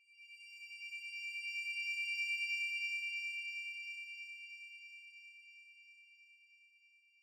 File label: rip